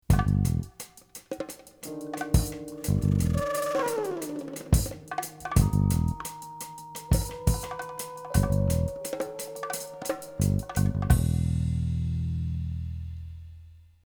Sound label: funky band spooky flute